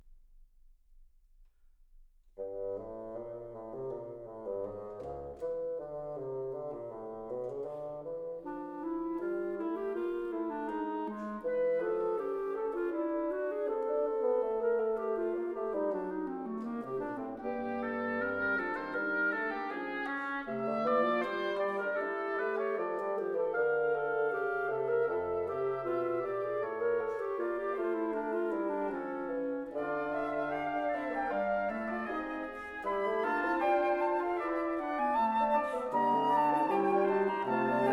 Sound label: Woodwind Quintet Fugue Fantasia